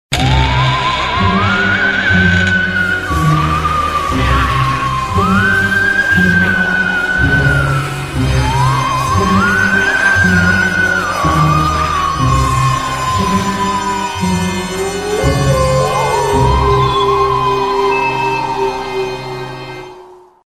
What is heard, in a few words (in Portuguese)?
musica de terror e fantasmas